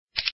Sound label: hit card; pokerBigA; neimeng